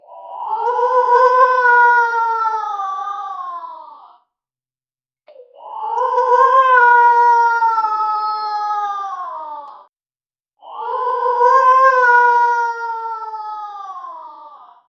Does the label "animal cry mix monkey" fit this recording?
Yes